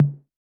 Electro Kit Tom

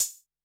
Elec Hf Hat

